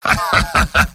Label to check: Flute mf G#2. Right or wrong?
Wrong